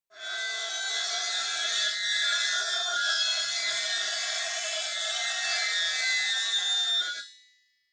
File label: metal lid screech